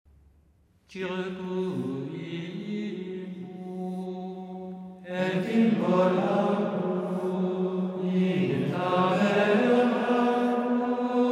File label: Comm Circuibo; pentecote; pieces gregoriennes du 6e dimanche apres la pentecote